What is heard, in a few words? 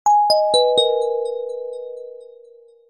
Tone; Lido